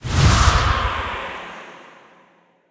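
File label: ghost exorcise; smite; zhongkui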